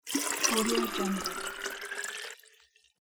filling thermos flask with liquid

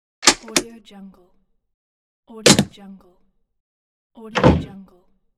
close doors; pure-noise

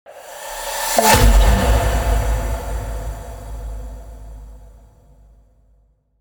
impact; minimal-studio

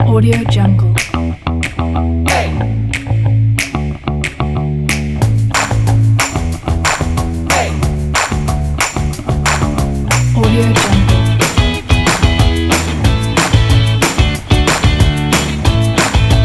fun upbeat energetic pop rock; loka-music

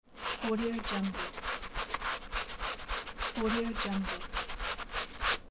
brushing; mrsoundfx

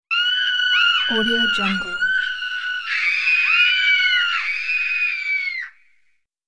female scream

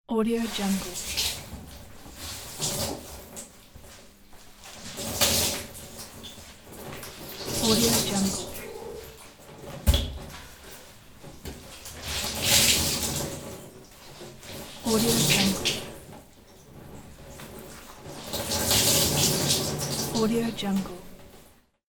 twisting wet clothes; soundfxpro

